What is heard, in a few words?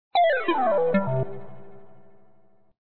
Descending Gamey Blip; bubblespinner; html5 games